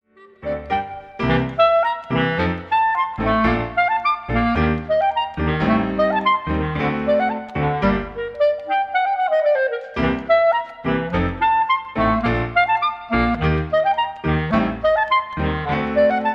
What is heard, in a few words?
Clarinet Sonata Clarinet